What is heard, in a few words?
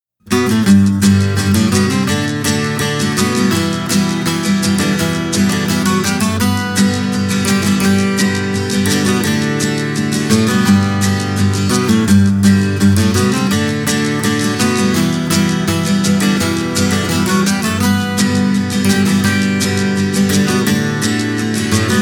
dixie instrumental